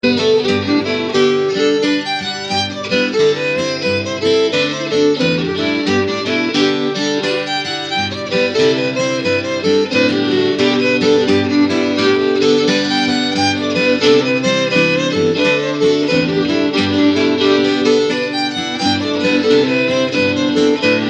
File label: Cowboy Jig